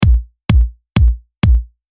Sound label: kick